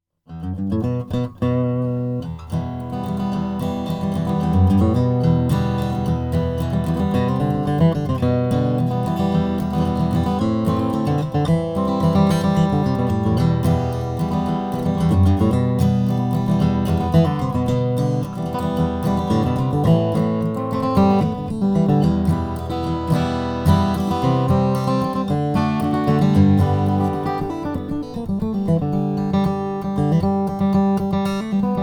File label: goodall jumbo cutaway goose spruce